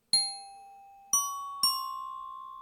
Diving osprey

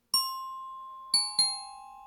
Diving sparrow

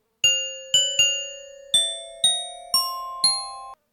Soaring crane

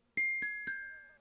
Diving waxwing

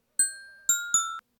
Hovering eagle

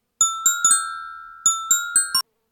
Soaring parrot

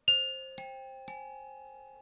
Diving buzzard

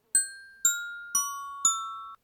Diving pheasant

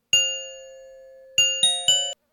Soaring osprey

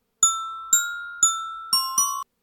Soaring finch

